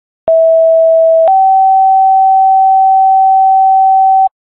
mass casualty tones

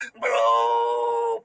puppy bark; martian

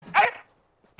bark; nogla

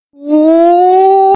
aaaaa

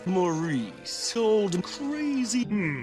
old crazy hmm

